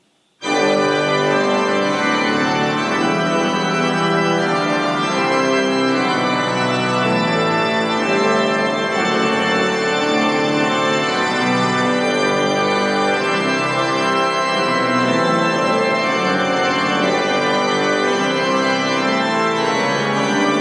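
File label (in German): Zeilhuber, pfeifenorgeln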